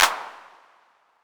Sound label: hand clap sound c sharp key, clap sample tuned to the C# note. Loudest frequency: 3274Hz; single hits one shots